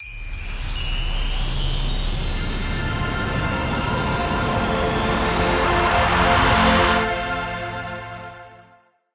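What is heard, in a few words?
Reel Near Win Sound; netent; happyriches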